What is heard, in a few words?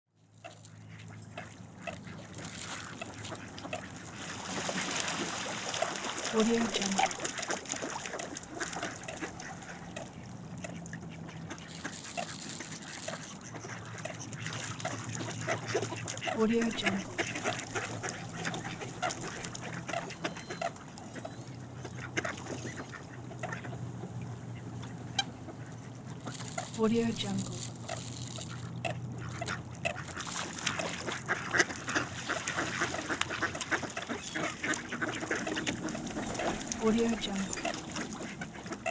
feeding ducks birds in water